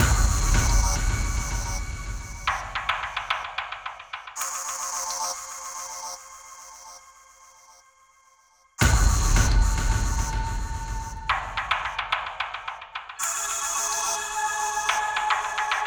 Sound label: Rail Car Dark Turn Loop; Silent Hill Downpour